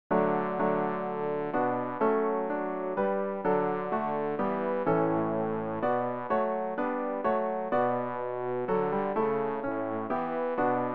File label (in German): bass; Ref. Gesangbuch; RG 468: Wir wollen alle fröhlich sein